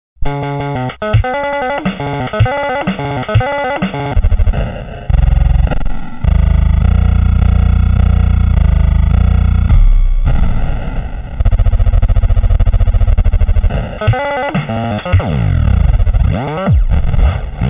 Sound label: drums